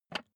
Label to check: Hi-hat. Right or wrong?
Wrong